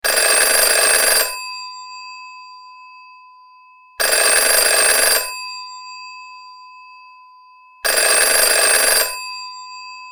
retro telephone ringing

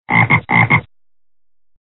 frog notification

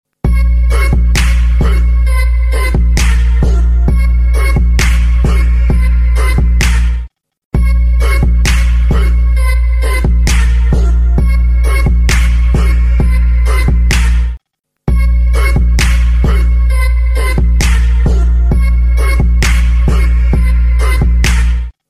drop the bass ringtone

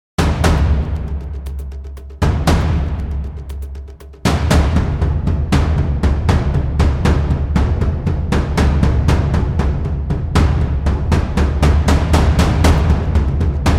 dramatic timpani ringtone

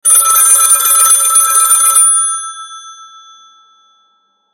very old rotary phone single ring sound

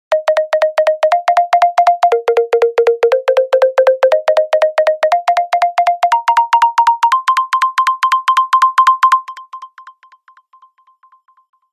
sonik synth ringtone

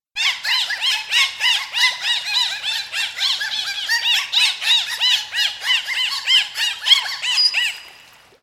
Dolphin Chatter

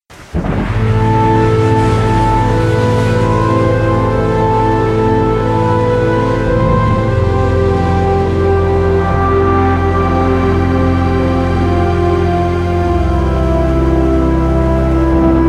her owl ring ringtone

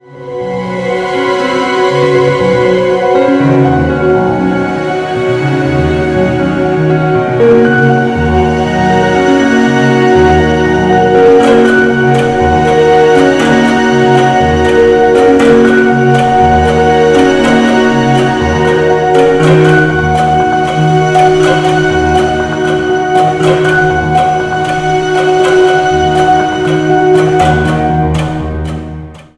drama mystery ringtone

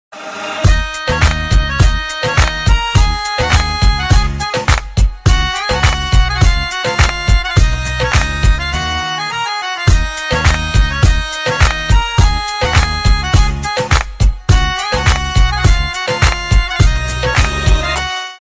punjabi ringtone

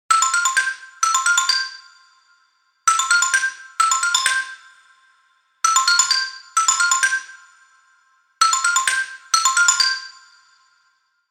simple xylophone ringtone

